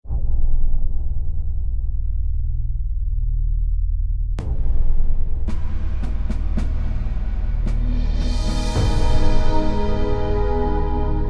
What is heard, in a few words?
C15 Cinematic; Fuxi Cinematic; Music and recording by Matthias Fuchs